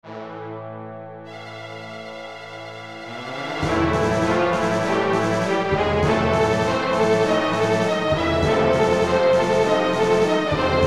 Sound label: Cannons Firing Orchestral